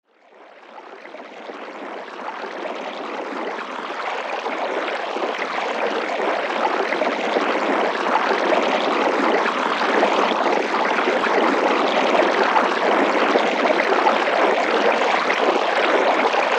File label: stream; sound oasis